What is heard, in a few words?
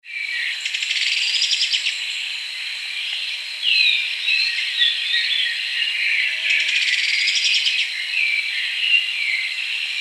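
Pogonotriccus eximius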